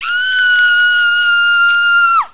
scream, nurse; svencoop; hunger; znurse

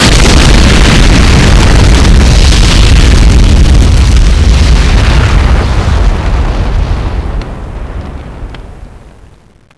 nuke fire; weapons; svencoop

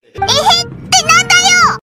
ehehe; svencoop; FZSaySound

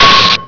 cbe hit; weapons; svencoop

